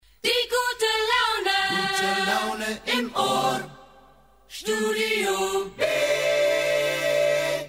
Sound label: Laune Acapelle, jingles; studiob